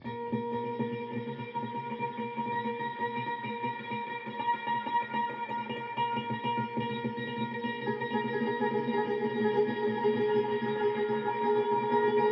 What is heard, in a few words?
Distortion